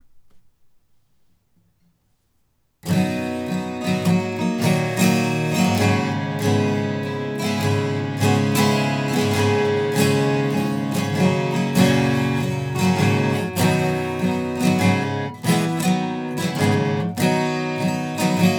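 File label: strum 12 left, hsguitar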